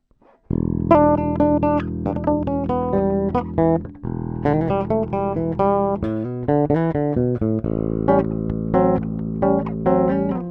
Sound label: Harley Benton BZ 5000 Blues Noodlin active